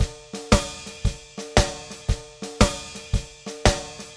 115 Pop Drums; Pop Rock; Fast Tempo; Digidesign; Pro Tools; Pro Tools Essential Loops

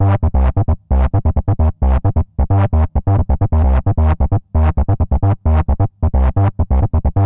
132 House Bass Arp Cmaj; Dance DJ; Digidesign; Pro Tools; Pro Tools Essential Loops

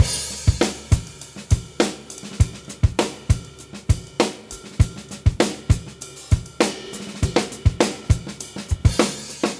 100 Pop Drums; Pop Rock; Moderate Tempo; Pro Tools Essential Loops; Digidesign

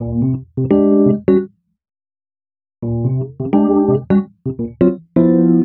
085 Acid Jazz _Cmaj, E-Piano; Pro Tools Essential Loops; Digidesign

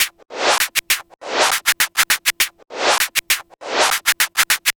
Vinyl Scratch Clap

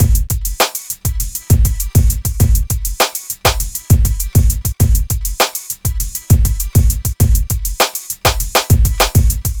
South HipHop Drums; Pro Tools Essential Loops; Digidesign

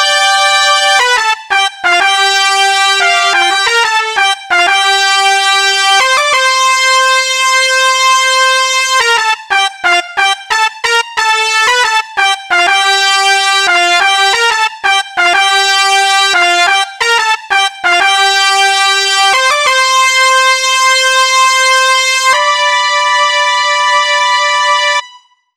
Horns; Pro Tools Essential Demos; Digidesign

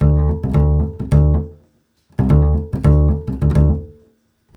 NuJazz Bass D; Digidesign; Pro Tools Essential Demos; Essential Loop Demos; Demo Session - Jazz

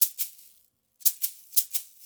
116 Dance Shaker; Dance DJ; Percussion; Shakers; Pro Tools Essential Loops; Digidesign

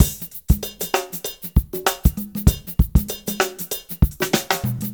097 HipHop Live Drums; Digidesign; Pro Tools; Pro Tools Essential Loops

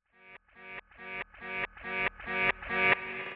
Chill Pop Echo Guitar F#; Pop Rock; Pro Tools Essential Demos; Digidesign; Essential Loop Demos; Demo Session - Pop Rock

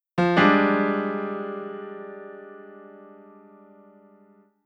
100 Acid Jazz Piano Gmaj, Grand Piano; Digidesign; Pro Tools Essential Loops

